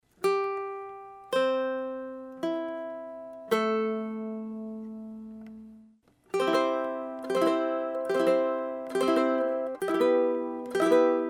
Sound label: 6strings, ukulele; sawaguchi